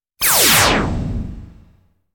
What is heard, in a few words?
Laser Effect